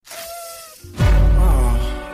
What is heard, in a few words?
SMS tone